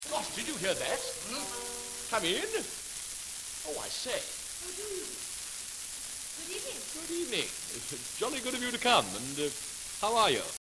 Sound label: crackle